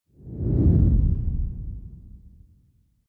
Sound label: whoosh